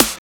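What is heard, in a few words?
CLAPISH SNARE; Drums In Your FACE!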